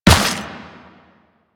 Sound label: Run Dirt, Footsteps; Warframe; Quests; The New War; Kahl